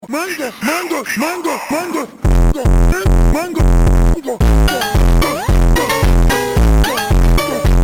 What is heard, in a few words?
mango phonk